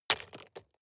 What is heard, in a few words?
drop stones small